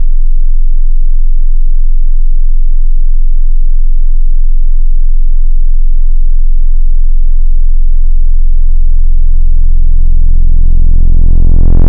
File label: Dist Tube, wavetable